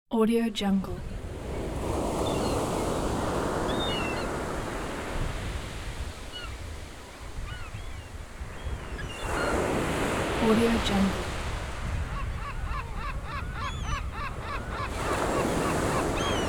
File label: beach with seagulls